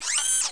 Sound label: display servo out